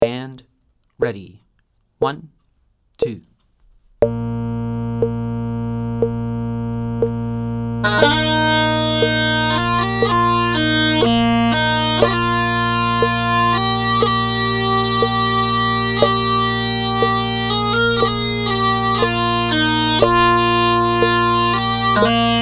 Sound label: 60bpm pipe section